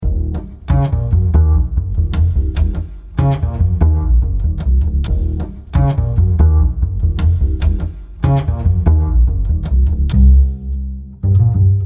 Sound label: Pizzicato; Knife Fight